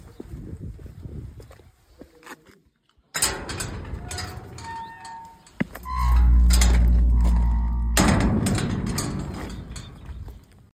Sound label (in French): PORTAIL CIMETIERE ENTREE; VILLIERS LE ROUX 16